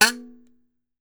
KALIMBA, African Melodic; E-MU Producer Series Vol. 7 – Old World Instruments

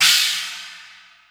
CH, Casio CTK-5000

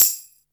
DR-660 Perc, Drums, Boss DR-660

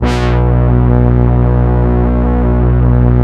ESQ 1 ANA BRA, SYNBRASS, World of Synthesizers

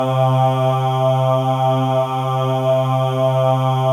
MALE AHS, Voices; Spectrasonics

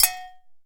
BULU SINGLE; Best Service; World Colours

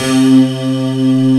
LINEAR SYNTH

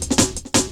Build Up Cut, Drum Loops 166.5; Zero-G Jungle Warfare 1, 2 & 3

